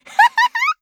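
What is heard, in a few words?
attack, Voice Line, Korean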